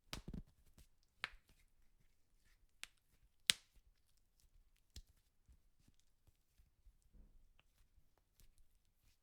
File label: knuckle crack